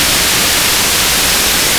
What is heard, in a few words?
ARP circuit, Noises, Analog; Xfer Records Serum; Serum Presets